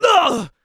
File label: shove; Garry's Mod; tf2